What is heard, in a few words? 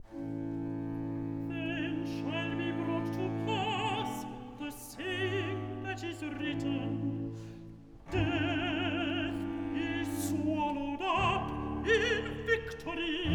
Alto; Part 3 Then shall be brought to pass the saying; Handel Messiah, HWV 56